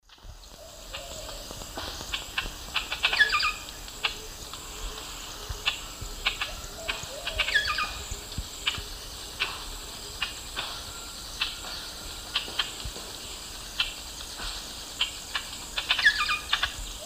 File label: Lampropsar tanagrinus, calls and songs pair; S. America; Eastern Lowlands